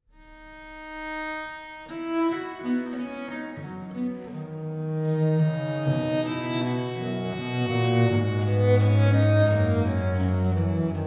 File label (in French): Duos for two Basse; LOCKE